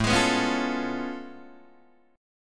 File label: A Augmented Major 9th A down Guitar Open D